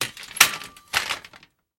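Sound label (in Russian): небольшой металлический лязг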